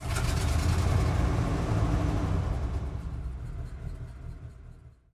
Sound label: engines; World of Tanks